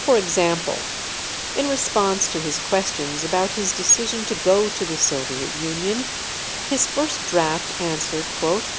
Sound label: gaussian; AudioPerturber